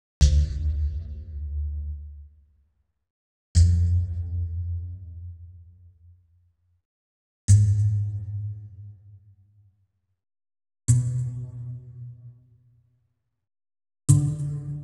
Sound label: pizz, orchestral; Klaus Schulze Collection